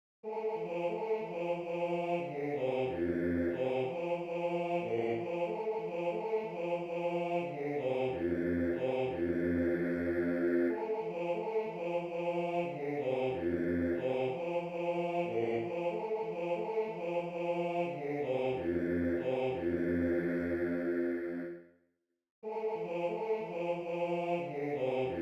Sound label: Bass II; Little David Play On Your Harp